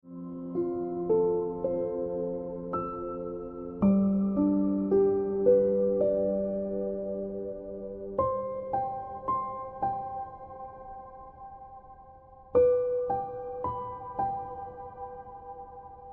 Ringtone Piano Music

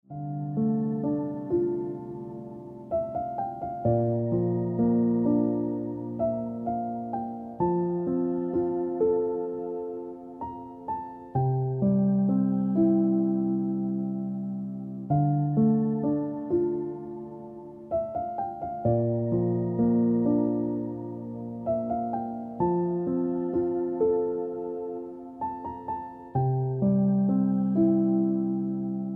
Ringtone Piano Music

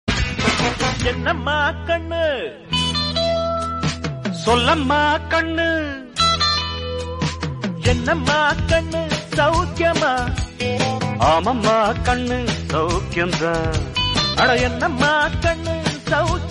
Tamil Song

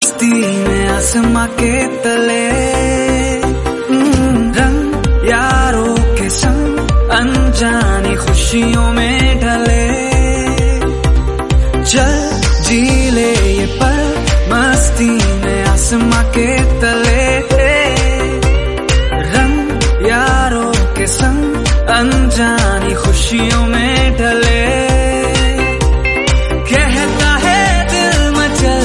Hindi Song

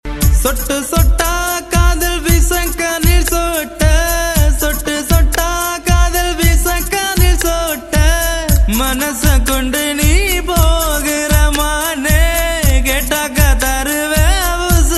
Tamil Malaysian

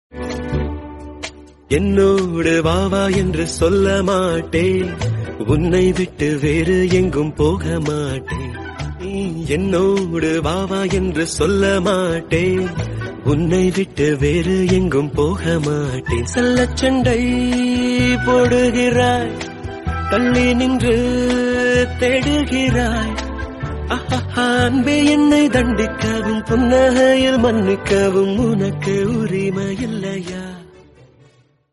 Tamil Romantic Song

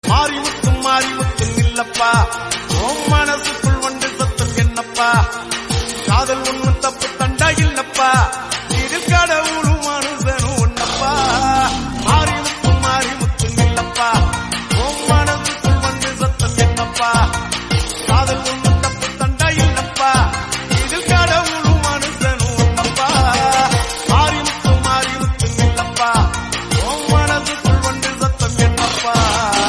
Tamil Song Ringtone; Kadhal Mannan

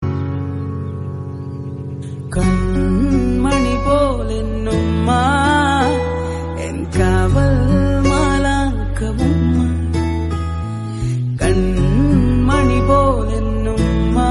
Gana South Indian

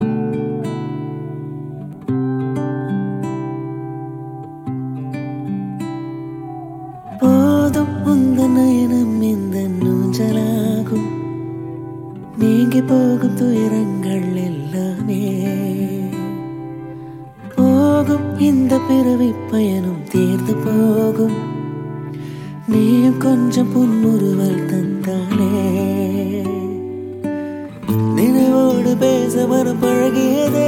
Ringtone

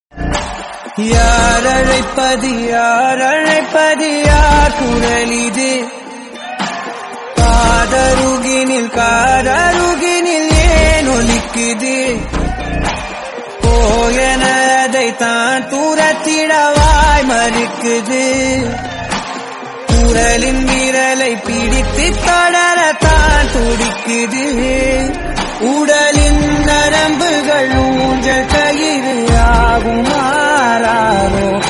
Love Song Tamil

